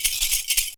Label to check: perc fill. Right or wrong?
Right